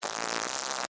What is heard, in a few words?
Insect Wings Fly, Steady, Bug; Animal; Short, Buzz; BlastWave